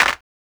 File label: clap, Claps